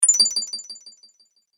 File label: Magic Health Boost, video game